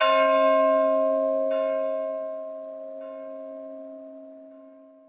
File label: small bell; ambient; World of Tanks Blitz